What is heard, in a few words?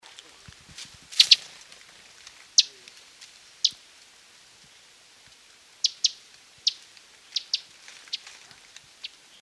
Wrens Kinglets Gnatcatchers; SEWR; Olmstead